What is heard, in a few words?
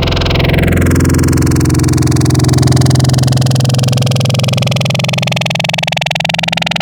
Growl Table, Serum; Cymatics